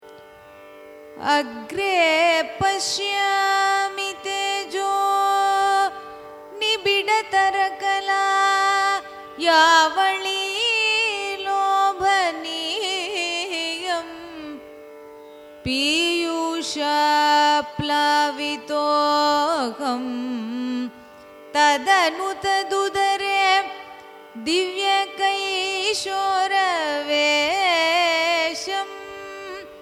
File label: raga kambhoji carnatic sloka; agre pashyami